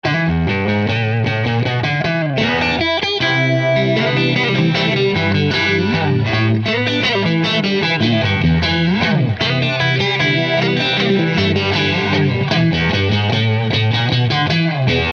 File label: MXR Phase 45 Strat Phase45 Randall Plexi RE210 on Right Side